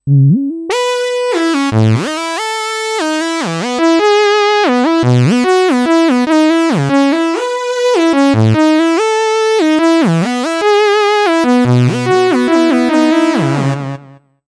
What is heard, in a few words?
edm glide saw, Kurzweil K2700